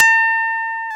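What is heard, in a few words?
Sup Rhodes G#4, Piano Rhodes; Keyboards of The 60's and 70's; Classic Rhds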